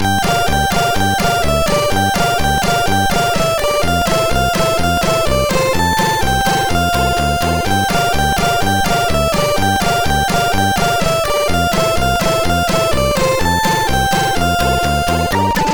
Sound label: Polka, MOS6581R2; One Night